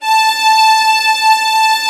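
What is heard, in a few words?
Vio legato A4; Digital Sound Factory Virtuoso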